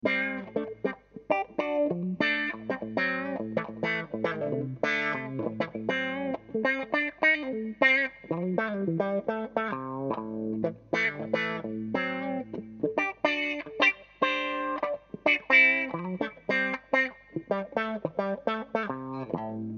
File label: beide 12h switchup